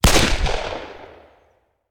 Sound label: Hand Gun; Sound Ideas; Guns